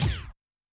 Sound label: SCRUNCH; Yamaha DTXpress-II